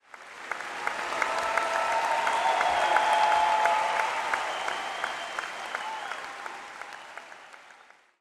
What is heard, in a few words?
crowd Chatter arena; spellbound